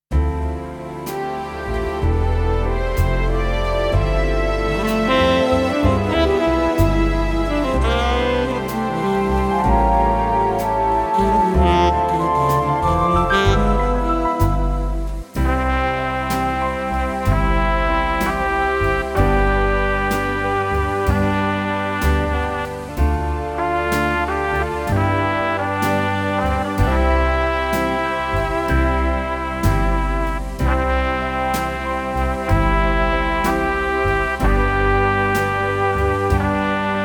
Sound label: Bluetest Keyboard